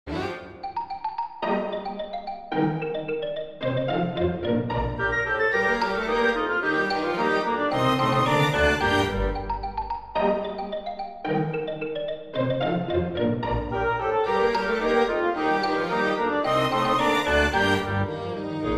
for winds strings; saint saens camille; fossils from the carnival the animals

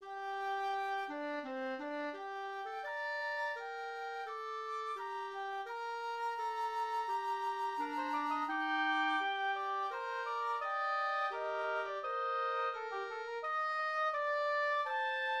woodwind quintet

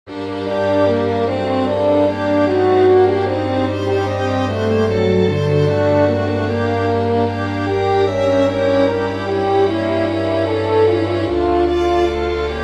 recitative for horn strings; bach johann sebastian; abend hle war

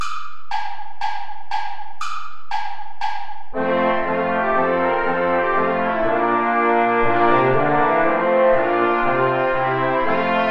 play along without trombone; steuerlein johann; mit lieb bin ich umfangen

